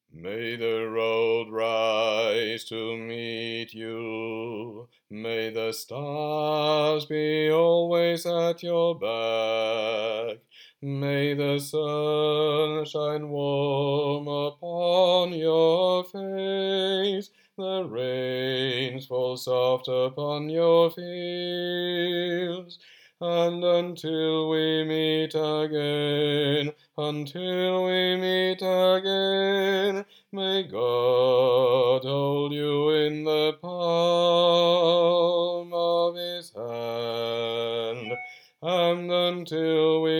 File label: An Irish blessing - bass